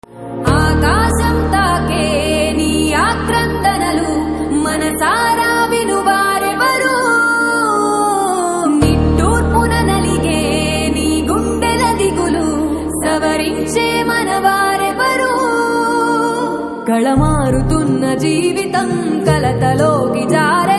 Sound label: Female Version Ringtone; Vakeel Saab